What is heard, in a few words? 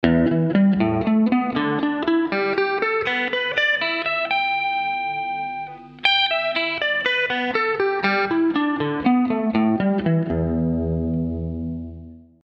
Pentatonics Basic; open string awareness; ragajunglism